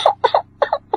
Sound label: cute cry; roblox